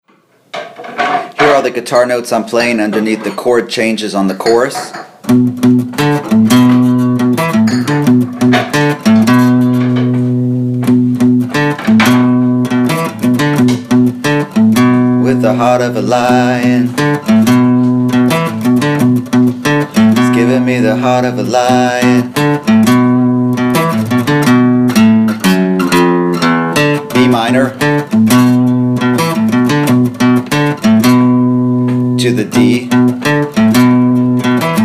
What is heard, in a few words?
guitar